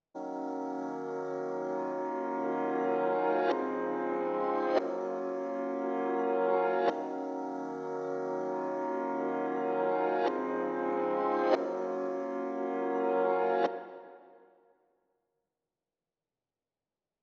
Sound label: 142 BPM A# Min Reverse Piano; Melody; RnB; Loop Stems; Cymatics; 2022 Melody Collection - RnB Pt 2; Maybach